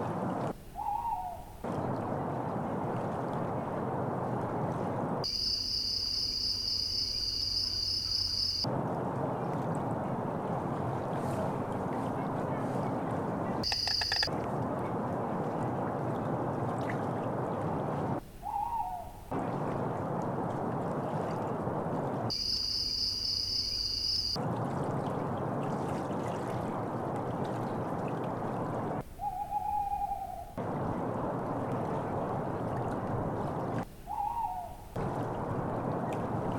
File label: night Background